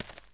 walk, dirt foot; The Elder Scrolls III Morrowind GOTY; XBOX

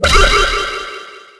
Object Lands; Finding Nemo; XBOX

